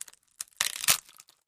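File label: Panel Stress Creak, breaks & splinters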